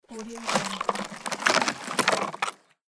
small floating ice movement

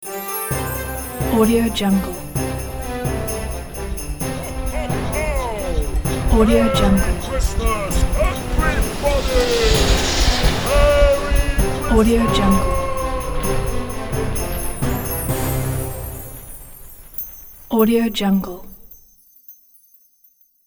santa on sleigh arrival

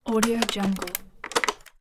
mechanism

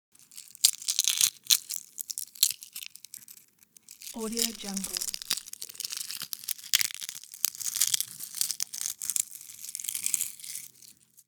zombie chewing

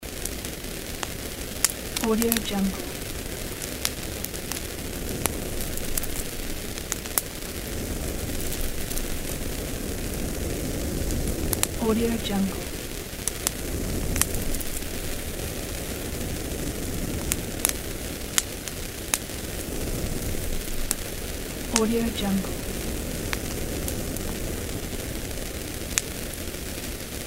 fire burning

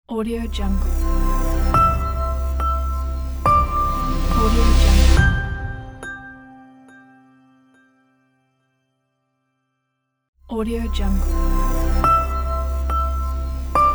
logo reveal

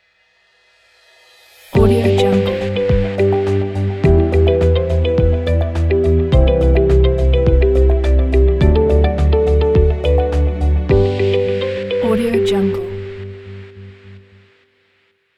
chilling ident

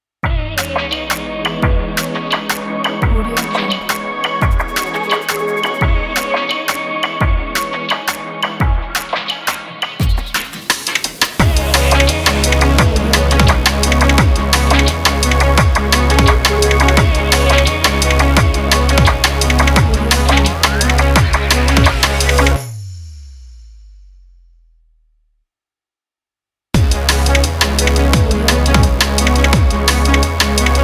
stylish opener ident

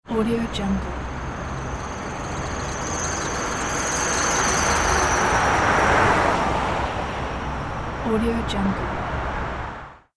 squeaking car

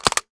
mechanism mech exit; soundmorph

